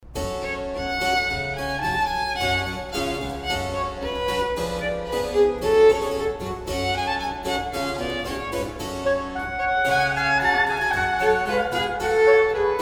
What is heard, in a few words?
Trio Sonata in G Minor 3rd Movement; Vivaldi; RV 81